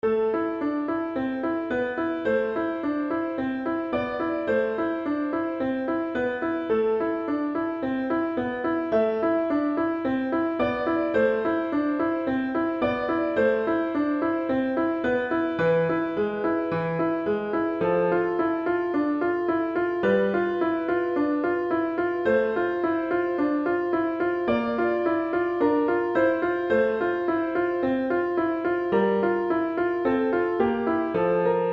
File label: Musicbox